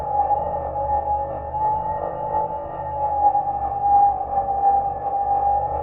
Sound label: Prop Warehouse Prop Rack Move Close; Titanfall 2; Boomtown